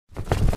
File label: flag flap short